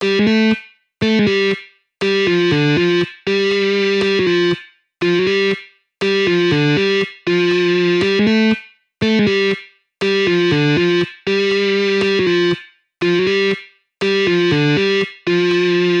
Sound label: Easy guitar riffs on 1 string